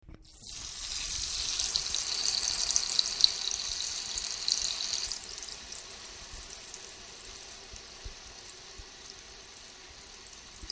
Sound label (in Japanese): しょじょおおおっと しっこ